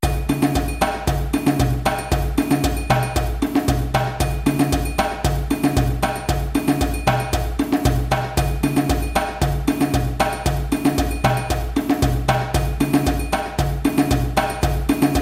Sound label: Donsole Groove 230 bpm, djembe